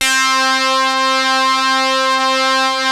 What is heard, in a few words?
700 B-CH C 1, Korg; Back In Time Records; Korg Universe VOL-1